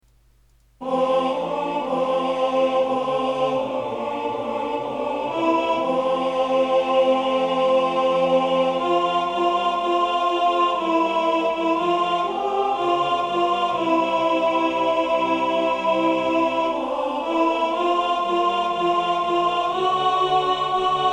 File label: let us break alto; Chants